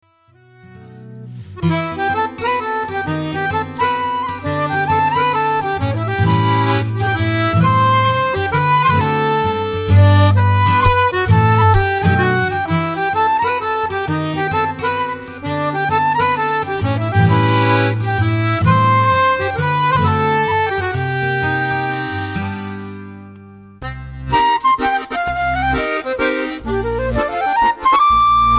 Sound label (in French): Mazurka; Mazurka de Lovendegem